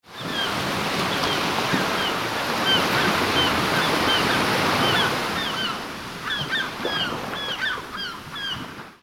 Rythms of the Sea Caribbean Surf

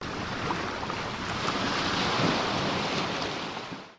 Spa Retreat, HAWAIIAN SURF

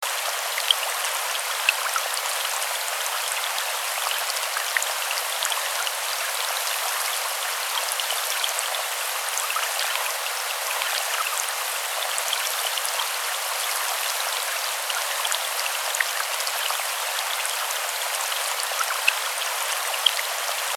GENTLE RAIN

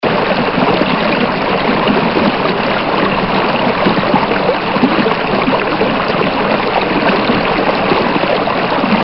Stream

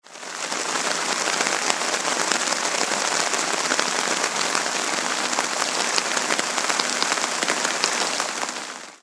Tropical Rain; Tropical Rainforest

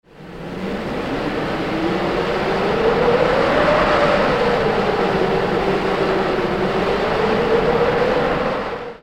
Wind

